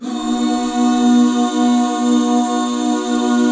B MAJ; Best Service Gigapack 1

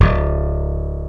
SLAP G2, BASS; East West; Global Sounds

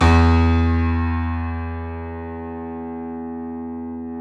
E 1, YC7 Piano mf

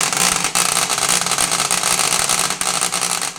TESLA, Science Fiction

